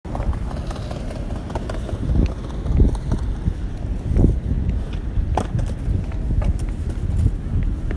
Skate

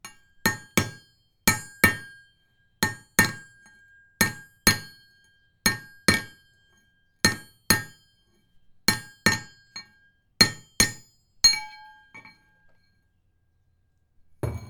Working With Hammer On Anvil

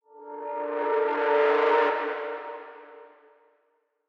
Cinematic Texture D Minor; Solaris

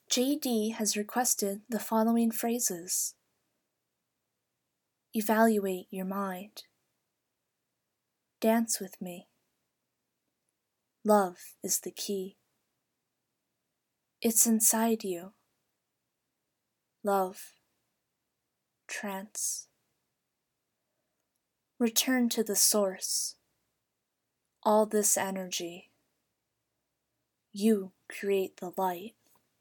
Trance

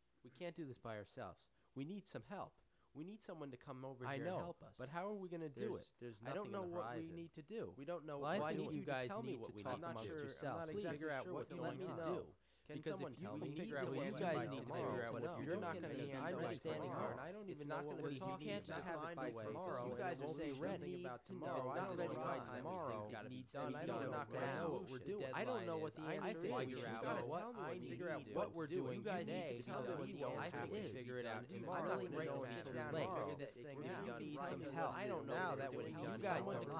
Panic

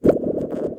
Cartoon Video Game Bubble Shot

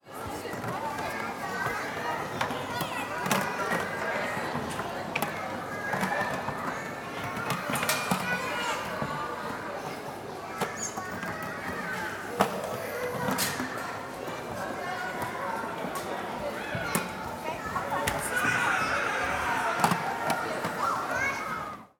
Supermarket Playground For Kids

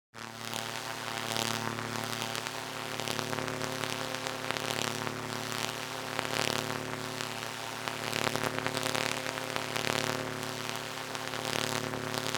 Corona Discharge

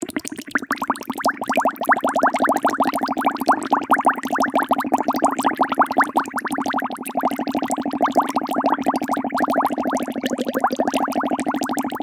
Bubbling Water

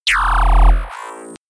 Lazer

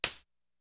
Tick

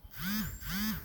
Phone Vibration

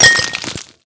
Glass Shatter

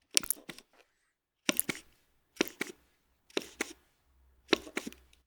Pump Squish Squirt Click Cream

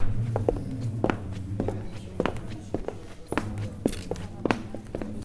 Steps

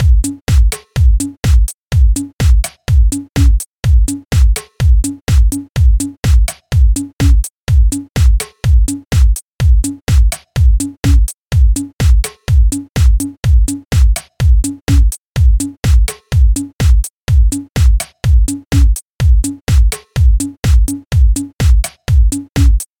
House 125bpm Beat

